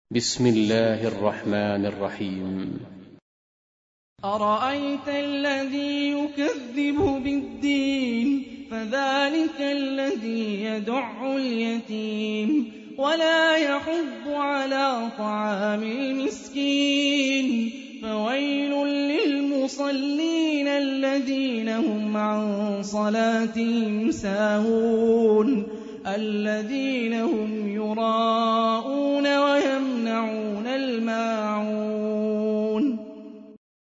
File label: Quran; Hani Refaey